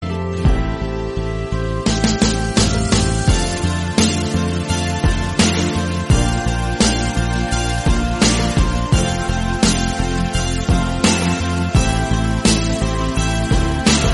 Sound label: instrumental korean pop music